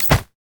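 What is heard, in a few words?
concussion grenade; LoL; heimerdinger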